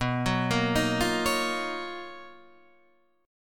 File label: B Major Sharp 11th, Guitar, DADGAD